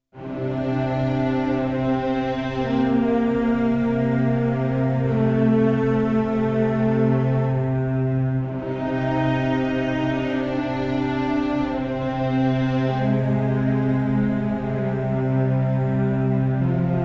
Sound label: Tension Strings; Mob of The Dead